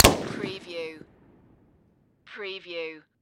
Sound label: Machine Gun M16